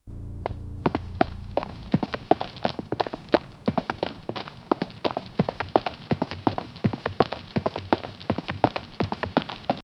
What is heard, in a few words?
fast paced horse walking